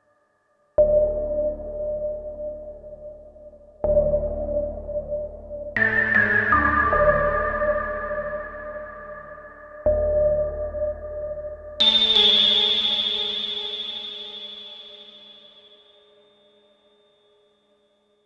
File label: Sh-4d Model Juno 106